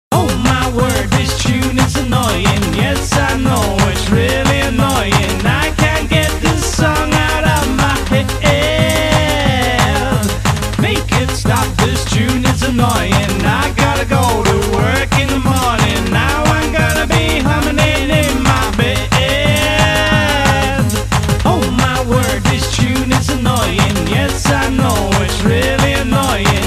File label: Tune Is Annoying